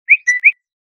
Notification Ringtone; Vivo Y21s

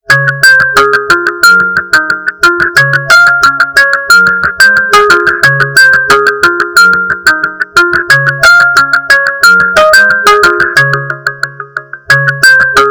Ringtone; Oppo Reno4 Z 5G

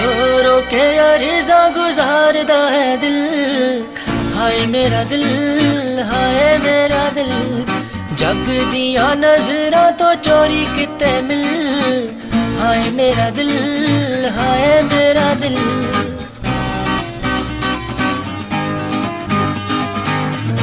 Punjabi Song Ringtone

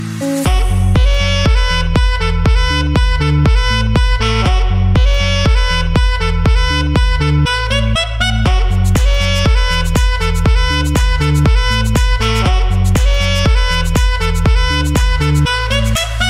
Galaxy Ringtone Samsung

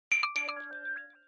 Flutter Motorola Notification Ringtone

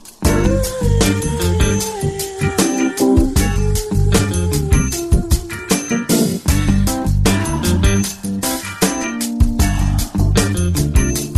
Beauty Voice Oppo Reno3 Pro Ringtone

